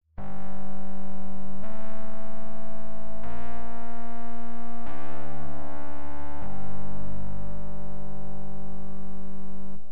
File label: bass cab